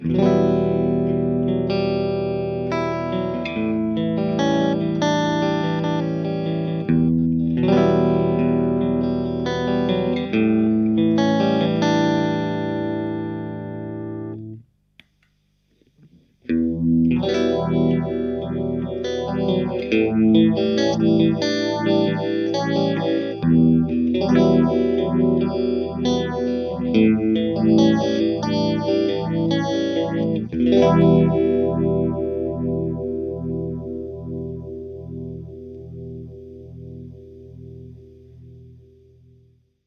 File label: strat neck middle; coron phaser55